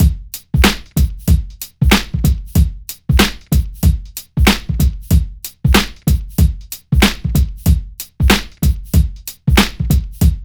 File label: 94 Halfbounce, Breaks; Lockdown Breaks